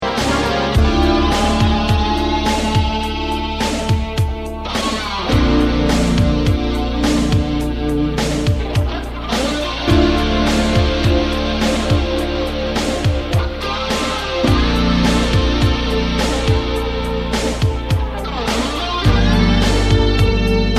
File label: Slow and Powerful, guitar